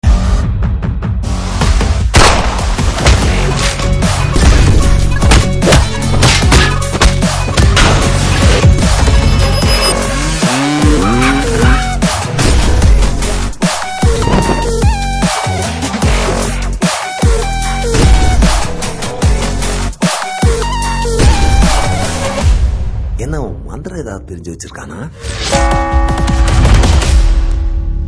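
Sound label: Trailer background music Ringtone; Maanaadu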